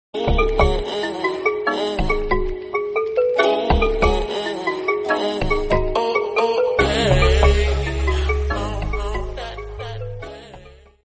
bgm new ringtone incoming call ringtone; dada raavan

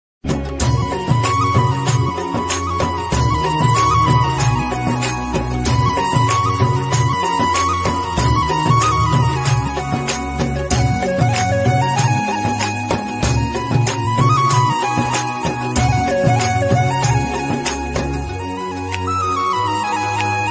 tamil love ringtone

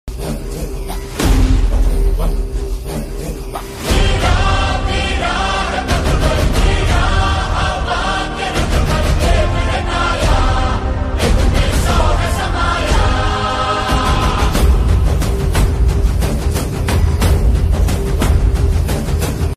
bgm ringtone hindi bgm; kanguva; nayak

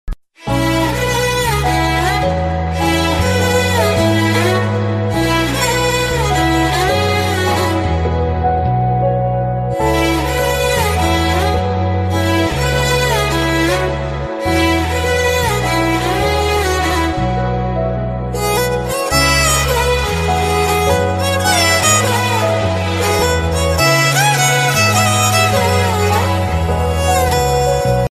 bgm ringtone, tamil love bgm ringtone; premam

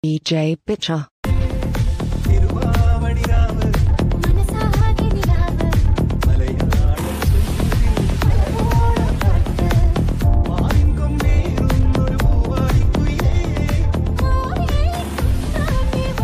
song dj mix promo

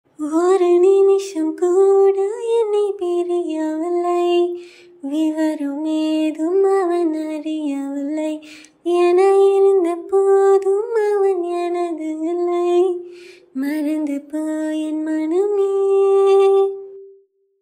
cover song without music only voice

